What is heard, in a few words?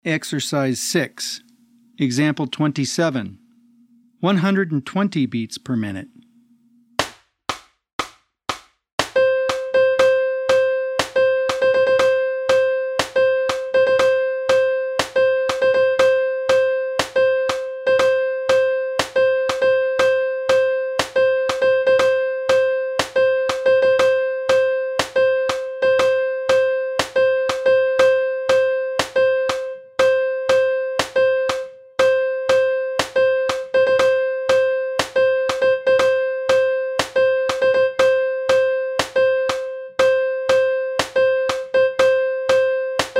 120 BPM